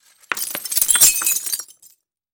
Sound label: Glass Falls Light; windows & mirrors